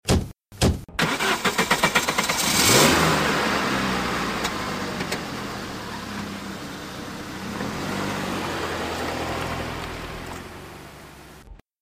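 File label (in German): zweimal Autotür Auto anlassen und Abfahrt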